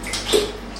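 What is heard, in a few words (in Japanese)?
糞「ポチョン！（糞が水面を跳ねる音）」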